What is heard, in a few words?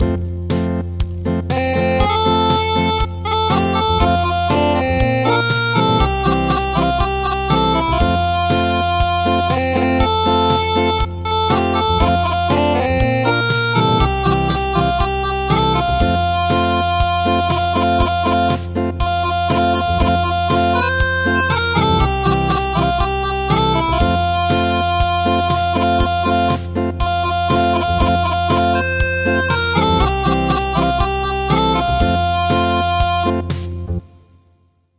Acoustic, traditional